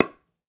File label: PickelballPaddle Hit Ball Medium